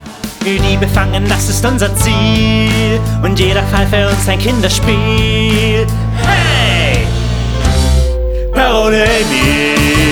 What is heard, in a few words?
Musical Rock